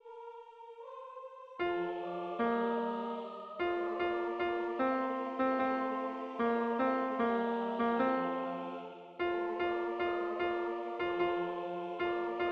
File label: TENOR; Brockes